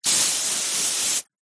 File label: garden hose spray water jet on window through fly screen; household